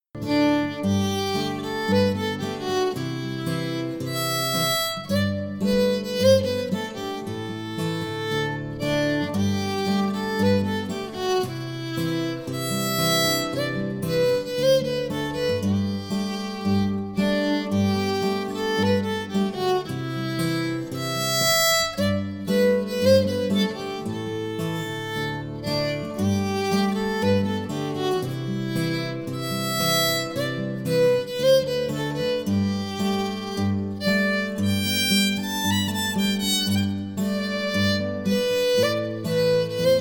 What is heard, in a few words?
playalong sample; Portsmouth